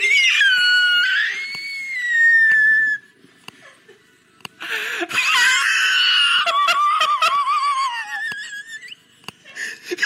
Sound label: wheeze